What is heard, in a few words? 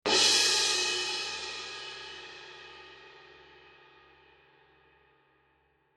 symphonic suspended; 17; Artisan; cymbal; Sabian